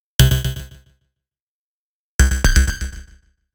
Mentis, аудио сэмпл синтезатора deep house synth sample